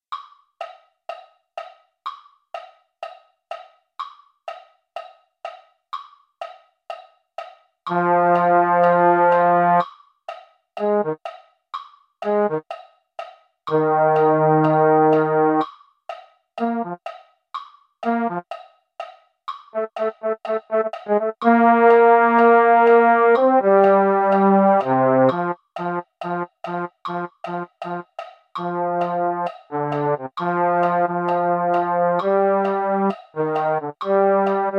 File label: baritone; concert corps